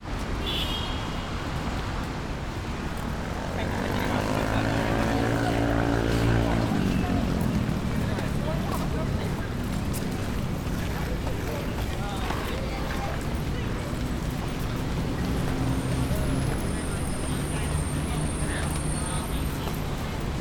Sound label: big city